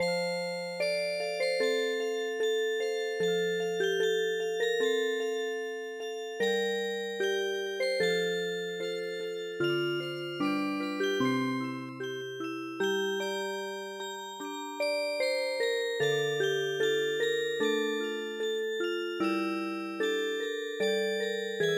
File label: chanson du toreador Music Box